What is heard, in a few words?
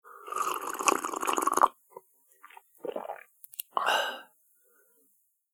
Slurping A Cup Of Tea; Human Sound Effect